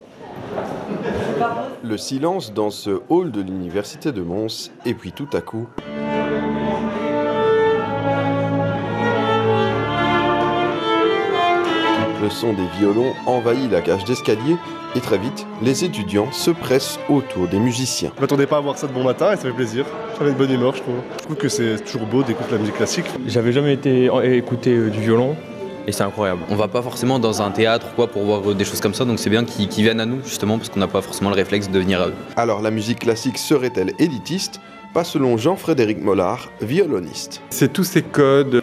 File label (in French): musique classique; UMONS; Vivacité; De la musique classique en plein couloir de l'université.